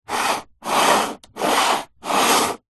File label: technology computer mouse old plastic slides movements wood table